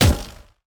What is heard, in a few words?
enemy boss damage shield; bloom minigame